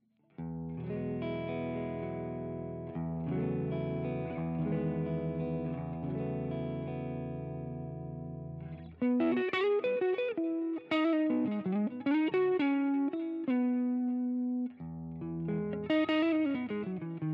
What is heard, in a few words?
Line 6 Catalyst Chime DI Out